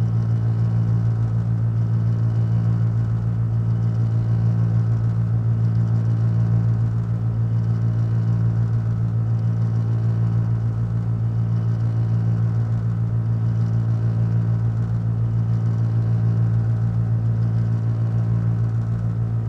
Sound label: machine whirr; OneShot Fading Memory